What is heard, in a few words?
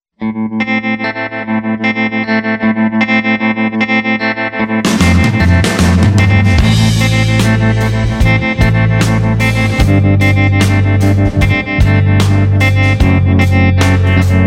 tremolo; empress effects